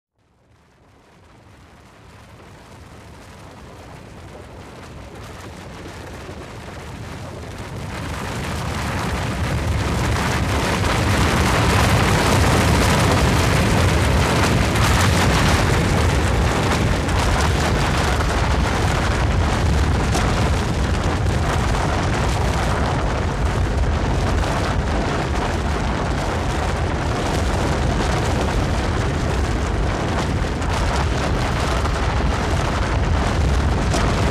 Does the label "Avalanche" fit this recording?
Yes